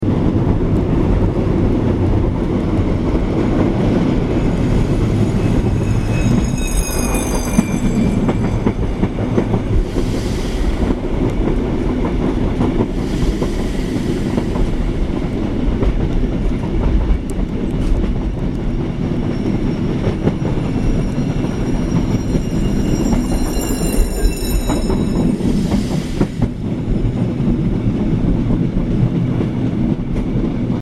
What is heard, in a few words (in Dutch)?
spoorweg bel, spoorwegknooppunt; moerbeke